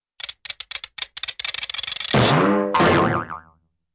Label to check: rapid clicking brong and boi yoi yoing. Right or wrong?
Right